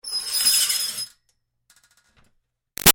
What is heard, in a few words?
Screech Metal Iron Board Shrill; Ironing Board; Materials; Big Room Sound